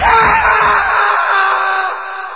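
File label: single loud male scream in space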